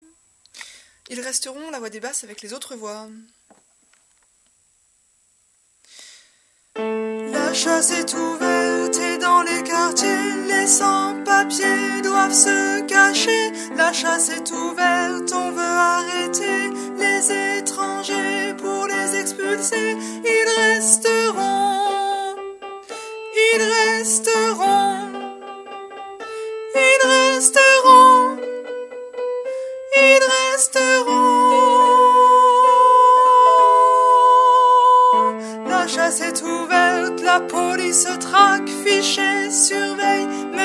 basses, chorale voix

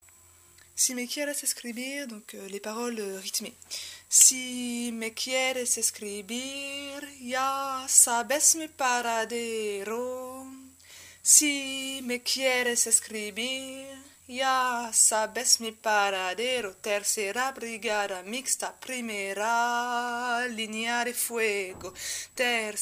paroles, chorale voix

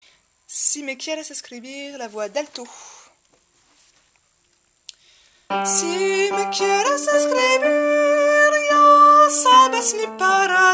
alti, chorale voix

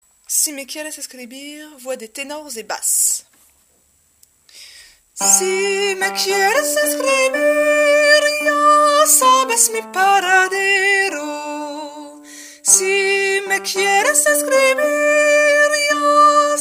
tenors et basses, chorale voix

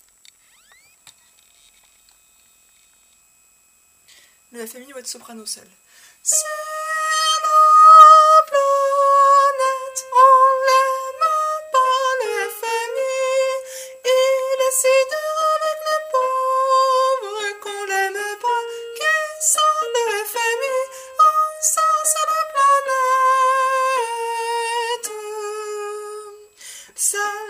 soprano, chorale voix

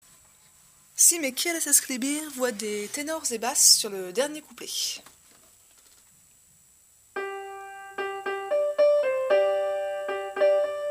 t et b, chorale voix

